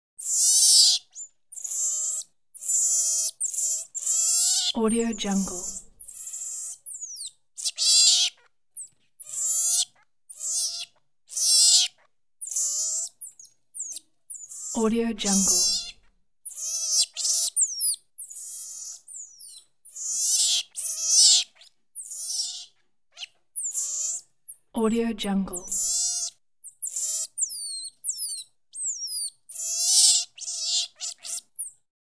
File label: monkey red bellied calls